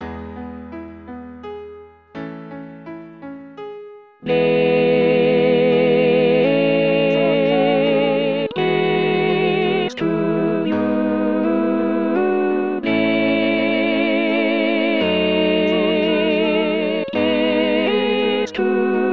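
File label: alto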